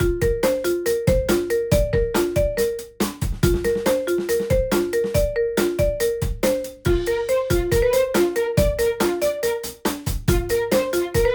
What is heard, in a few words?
Lightly, ringtones; KDE; Plasma-Mobile-0.1